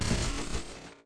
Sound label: brick